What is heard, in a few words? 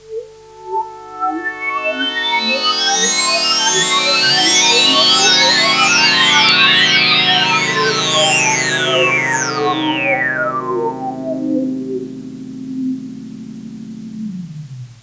filtersweep, poly